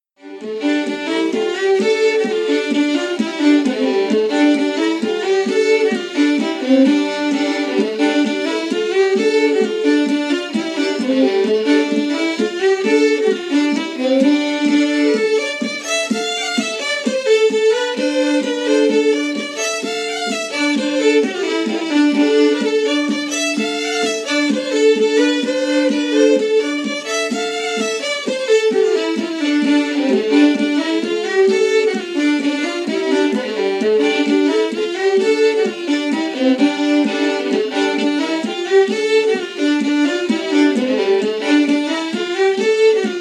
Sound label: pound note, fiddle; olearys; performance at world fiddle day scartaglin 2016